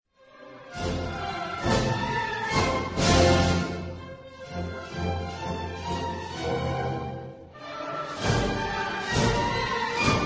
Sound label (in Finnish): brasilian kansallislaulu